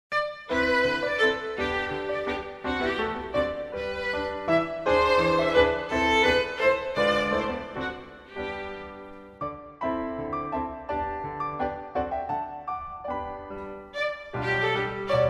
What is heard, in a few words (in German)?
Violine Klavier; Max Reger Op 103c Mein Schaetzelein